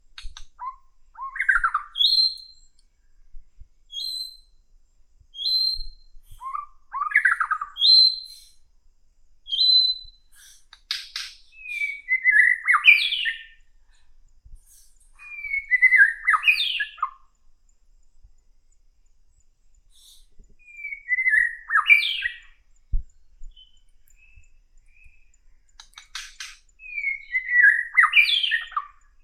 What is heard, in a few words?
jungle bird; ambience; dfcaverns